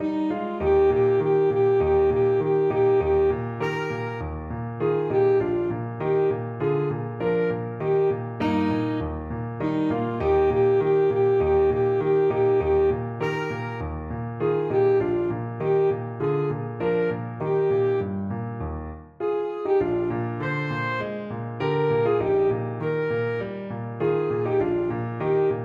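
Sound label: alto saxophone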